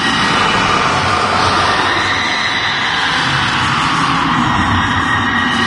charge, lostsoul; doom3

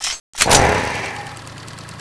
draw, weapons; cso; turbulent5

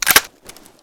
charge back, weapons f2000; fokku tc f2000

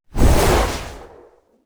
battle wing; macha

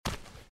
giant rat foot, npc

